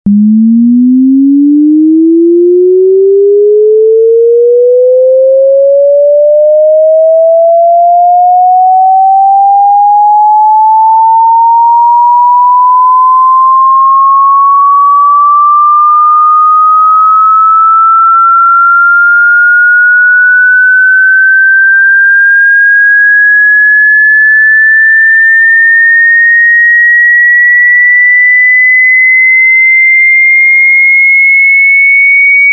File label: 200 Hz - 4 Khz